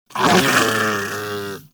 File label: Armadillo Vocal Snarl; Warframe; Characters; Hunting Critters